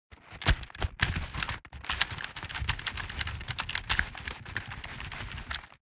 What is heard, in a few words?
Ranger movement run